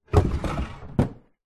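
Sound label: furniture large quality wooden sideboard drawer close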